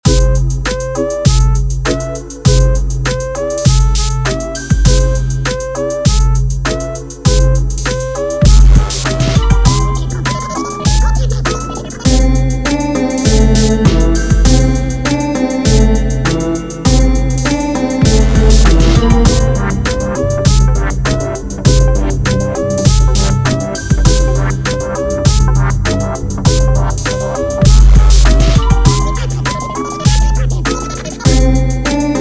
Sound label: loop